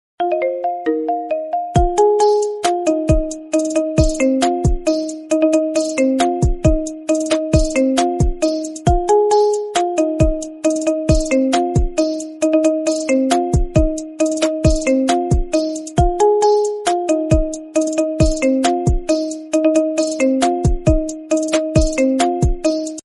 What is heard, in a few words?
beat marimba remix ringtone